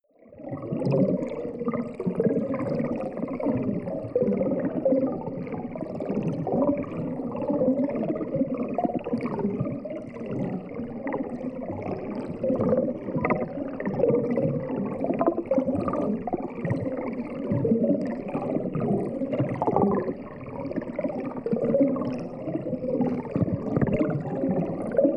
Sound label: water heavy bubbling